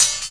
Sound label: IMPACT Metal Rattle Dirty; mono; IMPACTS; Universal Sound FX